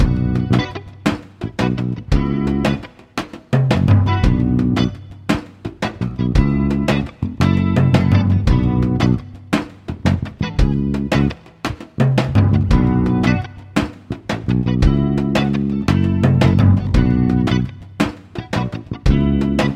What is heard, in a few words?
solo loop